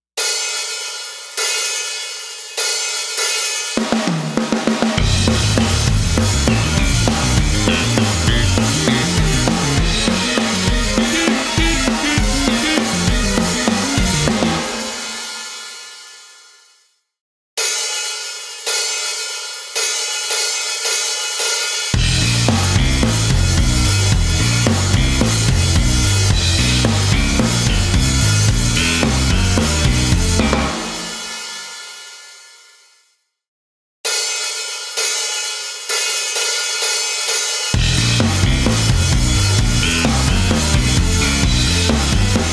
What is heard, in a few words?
karaoke